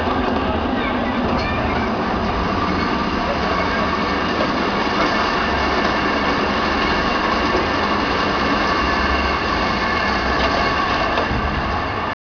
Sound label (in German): Rutsche; heidepark 2006